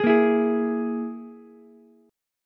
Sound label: Ab Suspended 2nd Sharp 5th chord, Ukulele; 13,10,12,11; strummed; JGuitar